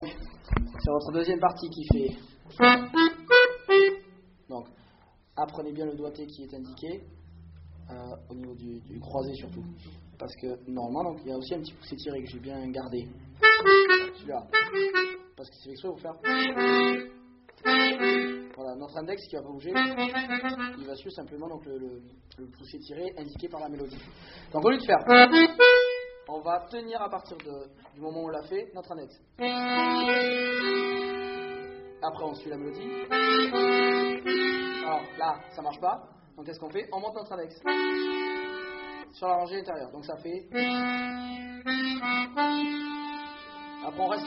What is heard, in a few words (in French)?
mazurka avec bourdon; Nouveau depart